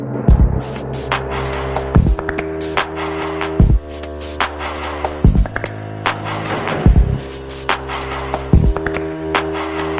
loading screen; gta iv

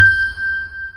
ding; sony

